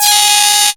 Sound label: CREEPY G#4, sequencer; Best Service Alpha Dance vol.2